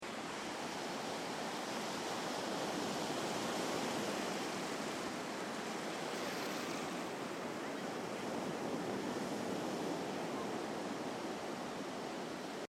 Ocean Beach Sound Of The Sea With People In The Background; Ocean Sound Effects